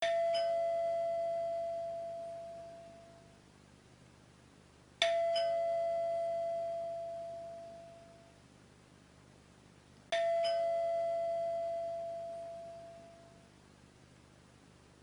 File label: nokia 1200 ding dong ringtone